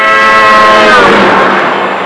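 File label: train pass; trasporti; treni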